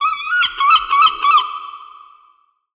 seagull; Garry's Mod; tf2; mvm warehouse

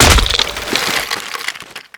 skeleton break, halloween; Garry's Mod; tf2

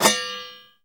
item metal pot pickup

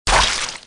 gore; kolmods proj impact

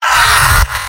pain sharp; tf2; garrysmod; mvm

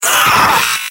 scout pain severe; vo; tf2; mvm

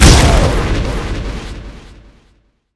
death; Garry's Mod; hungry worm 4

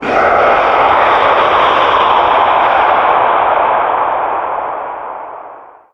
male scream; Garry's Mod; tf2

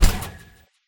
vertical jump landing; boxy boo v2

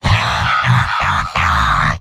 m laugh happy; heavy; tf2; garrysmod; mvm

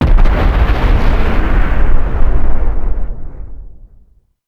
explosion big, heavy bomb; gbombs 5

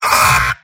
medic pain sharp, mvm; tf2; garrysmod

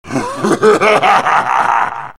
knight laugh; vo; tf2; garrysmod; halloween boss

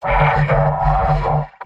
pyro m negative vocalization; tf2; garrysmod; mvm

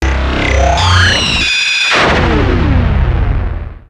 death; Garry's Mod; tf2 content

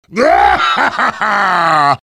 cheers; heavy

